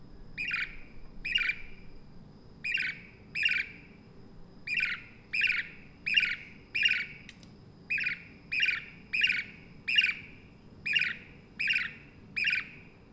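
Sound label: Merops persicus juvenile; Call series of hand-raised fledgling, soliciting for food from a human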